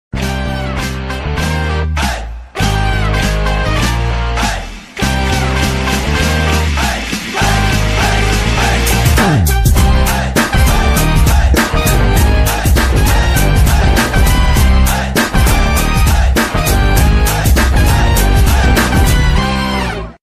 mass bgm ringtone tamil bgm attitude bgm

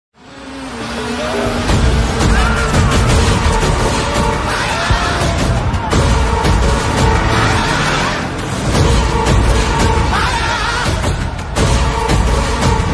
villain bgm, horror bgm; demonte colony 2 movie

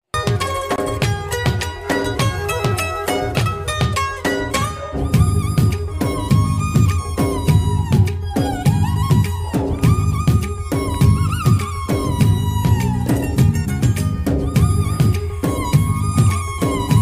heart touching ringtone bgm; daringbaaz aashiq movie

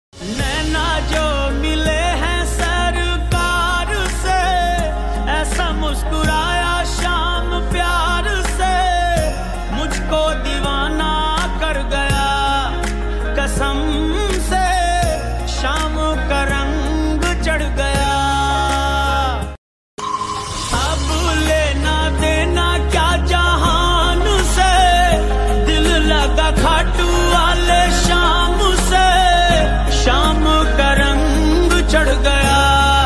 ringtone slowed reverb; khatu shyam

